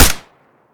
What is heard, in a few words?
kiparis silencer, weapon